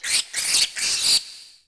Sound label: RAT; UrbanChaos